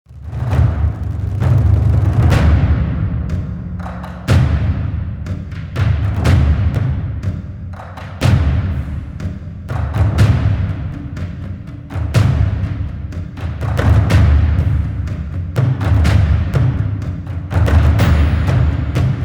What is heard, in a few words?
Drums Only Version